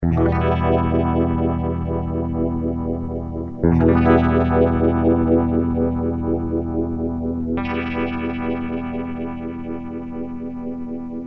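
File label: clean electric guitar voce spin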